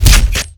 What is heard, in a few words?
SFX attack; Guns n Zombies